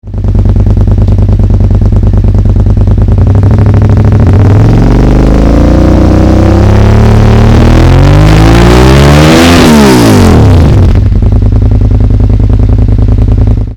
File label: micron full exhaust 1k 10k